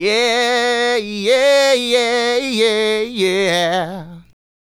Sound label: Male Solo, Hip-Hop and RnB Vocals